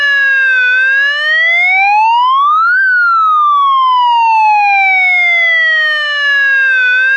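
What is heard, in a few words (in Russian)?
мультяшный звук полицейской сирены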